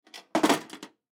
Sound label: Cash Box Set Down Wood; Office; Blastwave